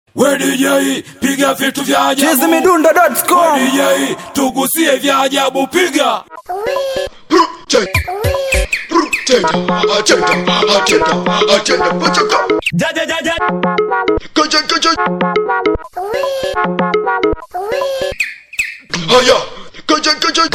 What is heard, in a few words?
Beat Singeli